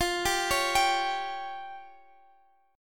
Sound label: F Suspended 2nd Sharp 5th F down Guitar Standard; Fsus2#5; arpeggiated; capo=3